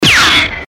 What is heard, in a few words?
gun shot whuii